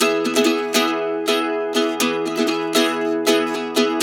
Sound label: RIFF, Blues Guitar Samples